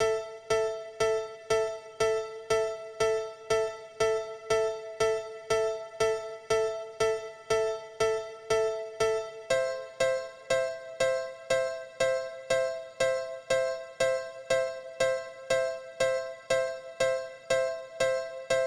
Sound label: Dance Piano R; super crush k.o.